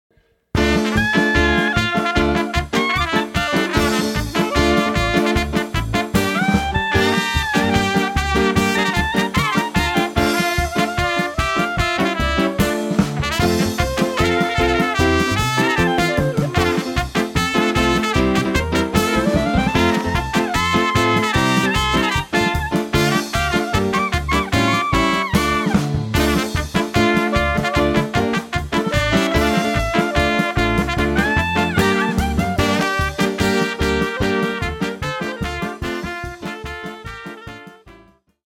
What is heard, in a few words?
Klezmer